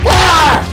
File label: gamer scream ajeuh